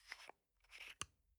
toothpaste screw cup open soft

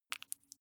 flesh wet greasy squish

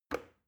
plastic button start push regular

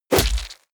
heavy punch gore short

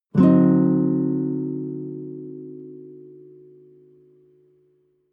nylon guitar chord full diminished

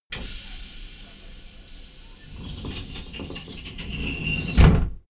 train intercity train hydraulic slide door close

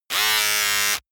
servo small buzz

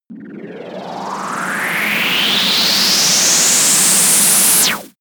spinning fire

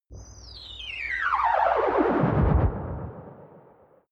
crash landing ufo

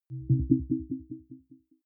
button echo chord arpeggio

